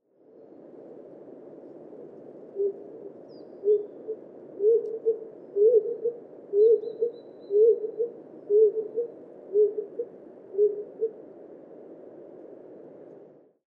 pigeon colombin

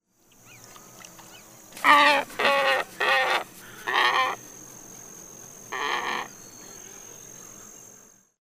ibis falcinelle

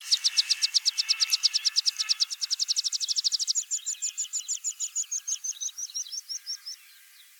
pipit farlouse

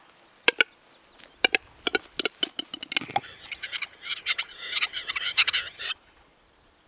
grand tetras

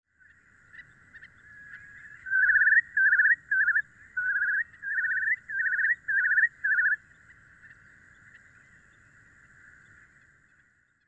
courlis corlieu

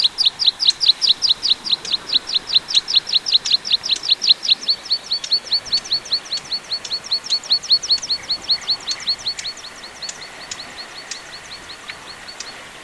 pipit maritime

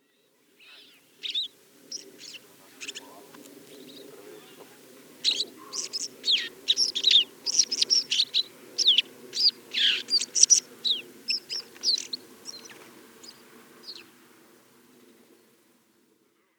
niverolle alpine

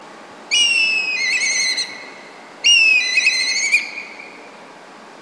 milan noir, orn

